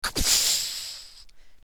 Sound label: Explosion; Assemblee SFX